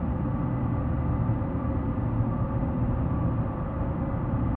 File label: roomtone low; adams venture origins